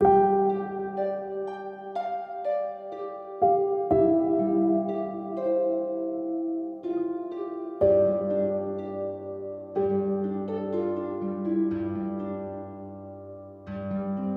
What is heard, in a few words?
123BPM; TARDISCrystalGame